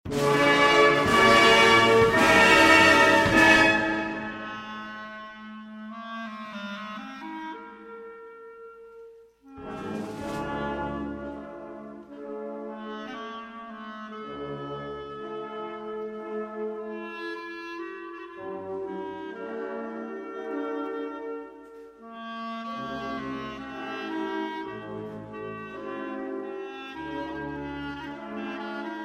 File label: Czardas; Jubilee